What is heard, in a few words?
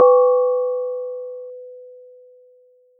risset